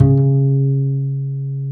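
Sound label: double bass C#2; Univers Sons; Jazzistic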